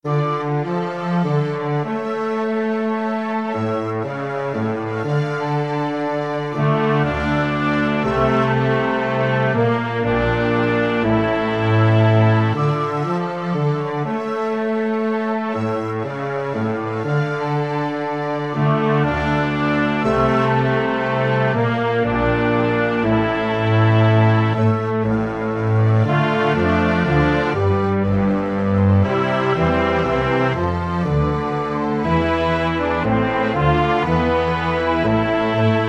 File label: orchestra